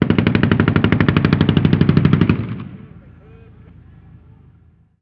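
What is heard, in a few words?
gun assault medium; Call of Duty Modern Warfare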